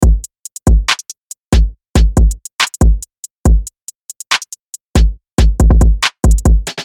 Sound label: Hip Hop Rap 140BPM